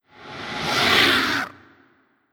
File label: Scream